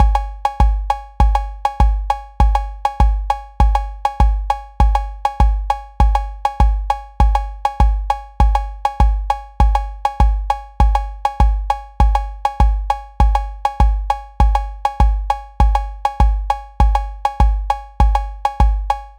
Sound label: cakewalk variation